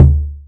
bass drum one shot d key, steel kick drum sample tuned to the D note